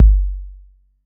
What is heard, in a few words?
BD 808